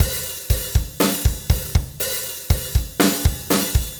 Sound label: soft